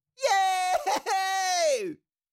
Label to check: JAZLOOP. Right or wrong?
Wrong